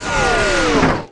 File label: Mech Powerdown; Tallan Mech; Aliens